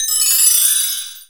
percussion, Ethnic Perc

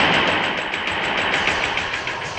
Delay Stack, 100bpm; rhythmic inspiration

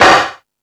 Found Sound, Drum Machines

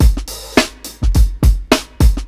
105 8TH BEAT, DRUMS LOOPS; BASEMENT DRUMS VOL 2

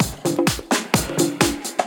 Latin Loop 128 BPM

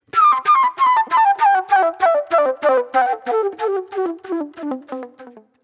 Pop Flute

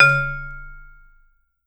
Vibes; Sampleheads - Dave Samuels Marimba & Vibes

